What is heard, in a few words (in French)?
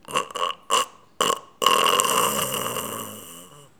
agonie